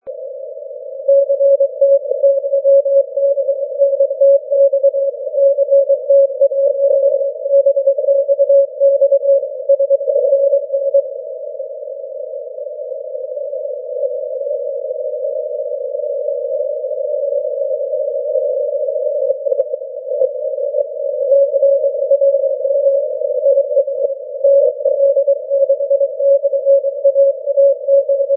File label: 20m cw